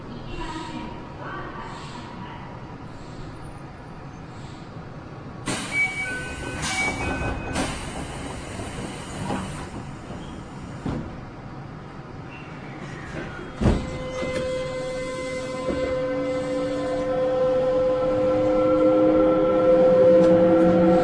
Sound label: vvvf, mitsubishi; toei 5311